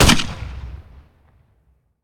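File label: Weapon grenade launcher fire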